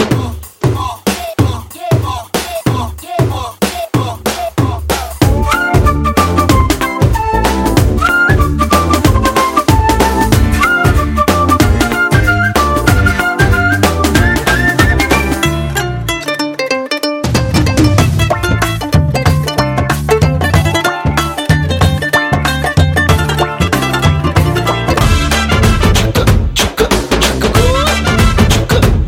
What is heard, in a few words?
Music Ringtone; Gabbar Singh; Telugu